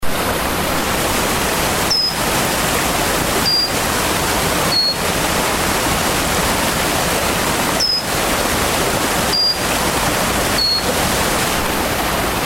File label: wren babbler pygmy Pnoepyga pusilla